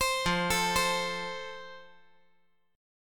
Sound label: F Major; 17,17,17,15; down; 5G,4C,5E,5A; chord shape